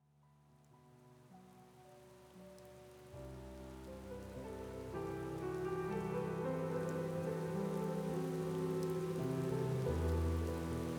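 Rain Noise And Thunder Sound - Rainy Road. Noise And Sounds Of Rain, Thunderstorm; Shum Dozhdia I Zvuk Grozy; Dozhdlivaia Doroga. Shum I Zvuki Dozhdia, Grozy, Urag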